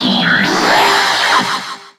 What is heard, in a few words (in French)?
Cri